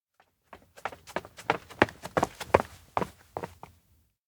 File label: steps outdoor school run; rikka; anemoi